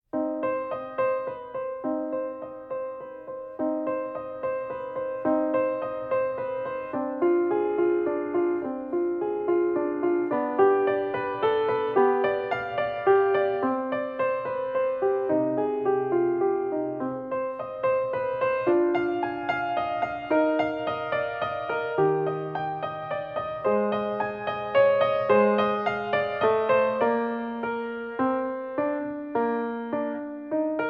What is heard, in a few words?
Sicilienne (Piano Accompani; Bach